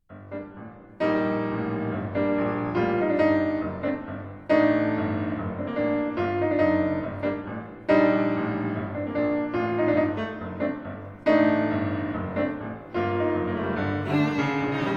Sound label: trio